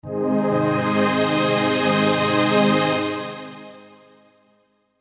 K5k SAW x3 Pad

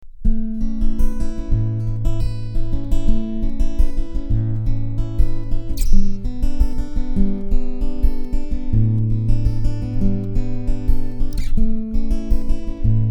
Melody Piezo

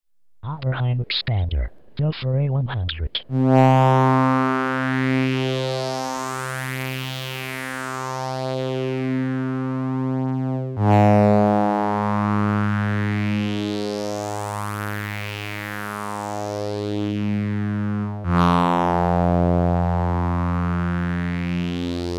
4 Pole Low Pass

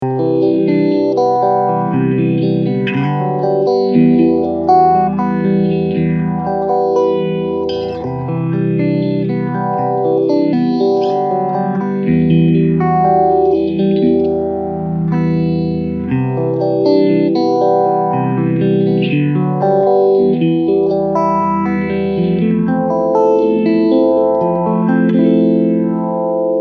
Slow Rate

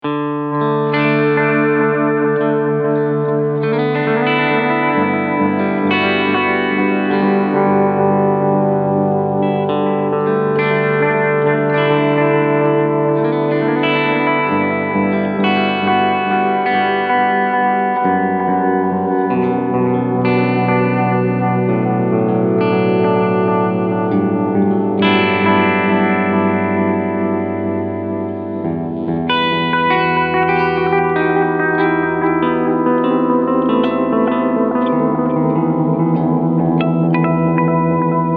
Echoman Medium Delay; XVive

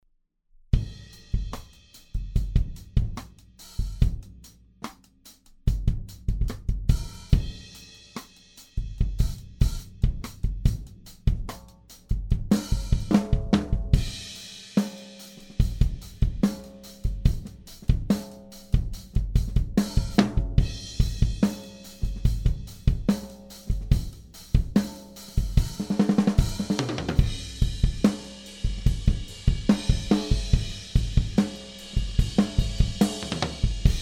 Drums Oktava OH

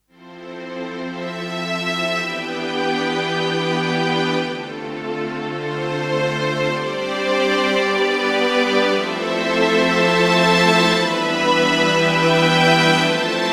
HP605 Symphonic Strings

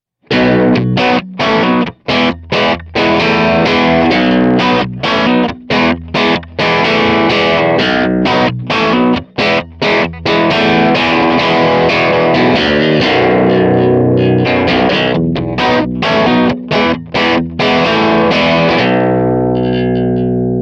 Reverend Descent W Bridge Humbucker Crunch

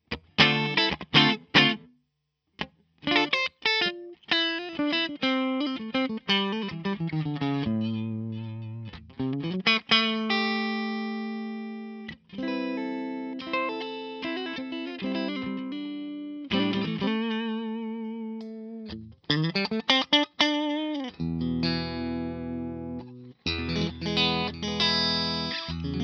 Clean Single Notes SC

